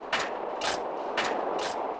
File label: skateboard; fxskateboard; The Interactive Alphabet